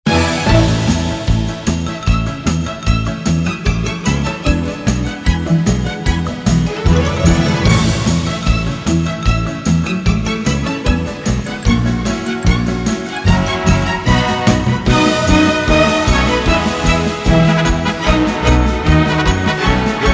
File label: Polka